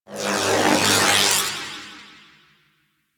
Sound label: Shield Electricity; Warframe; Warframes; Volt